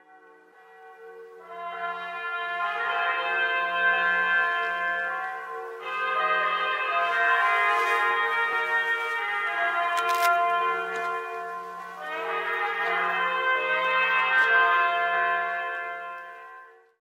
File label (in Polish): hejnal